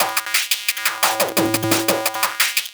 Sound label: Synth C#m, 175 BPM; loops; CHIPSHOP DELUXE